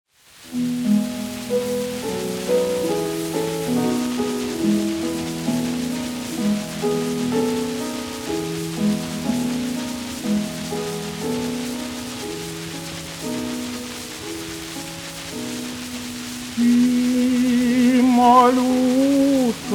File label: Lullaby Berceuse